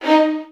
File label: VN FX, Virtuoso Strings; ILIO; Kirk Hunter Virtuoso Strings VOL-1